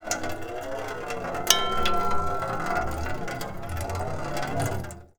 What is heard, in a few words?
kitchen sounds